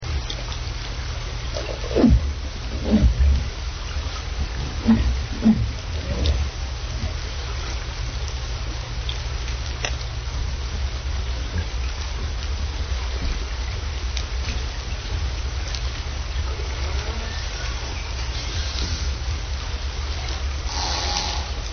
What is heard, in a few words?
humpback maybe